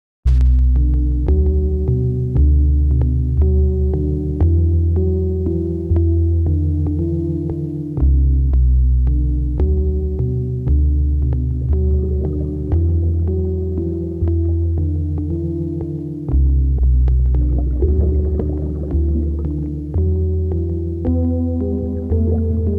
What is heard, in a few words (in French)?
ambiance; dossier rosa pink exposition creation sonore